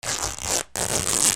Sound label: RIPPING